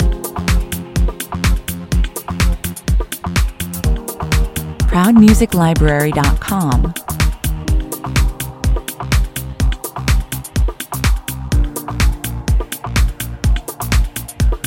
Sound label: on hold 125bpm